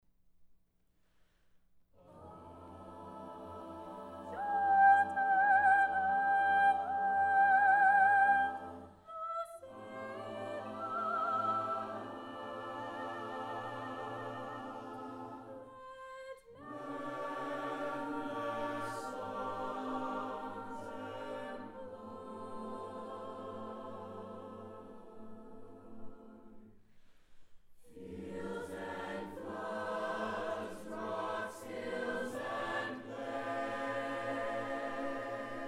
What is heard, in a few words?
Vocal Jazz Concert; Vocal Jazz Showcase